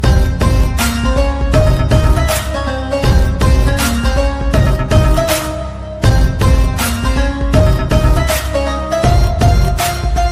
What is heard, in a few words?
dj remix music ringtone, bollywood ringtones